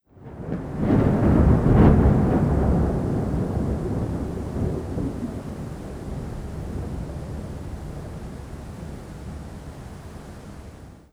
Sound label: thunder med; Rust